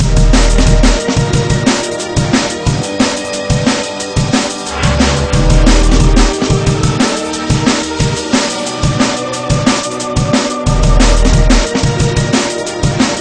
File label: hell boss theme; svencoop; sence; source of life